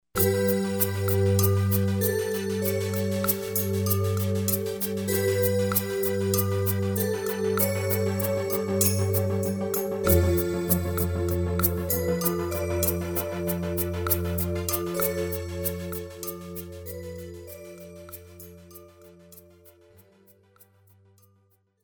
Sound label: Soundtrack Demos Going Home Time Passing